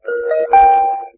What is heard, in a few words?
message new email